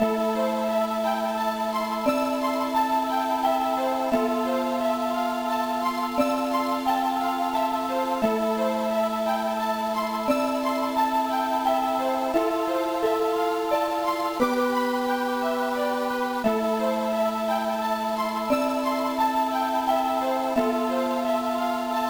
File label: 2D-Siren; Shin Megami Tensei II; GBA